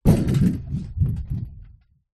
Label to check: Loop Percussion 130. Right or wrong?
Wrong